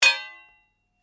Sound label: Blade Heavy Moving Impact, Sword; Foley sounds for melee weapons; Swords; Combat; SoundEffects-Foundation1